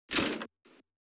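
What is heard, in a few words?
door close, Train; OpenBVE; 55022 Royal Scots Grey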